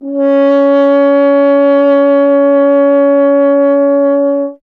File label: F. Horn